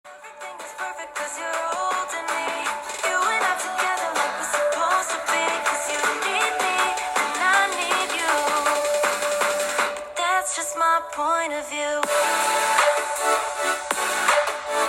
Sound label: Galaxy S21 Plus speaker